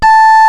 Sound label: a#3-e4, Organ Portable